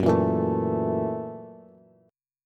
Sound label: F sharp 7th Sharp 9th, down, Bass; strummed; JGuitar